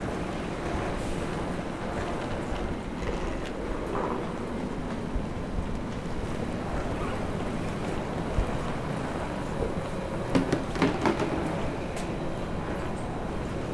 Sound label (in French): troupeau de valise, gare viotte